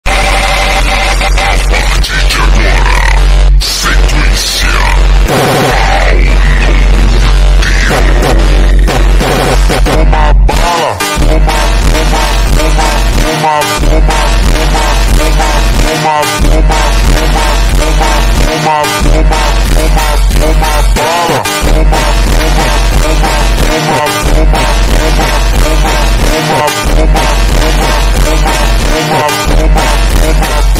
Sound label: super slowed